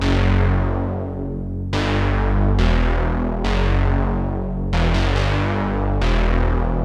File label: 140 BPM F# Min OB-6 Bass; Cymatics; Analog; Jupiter; OB6+P6